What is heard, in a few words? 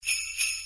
sleigh bells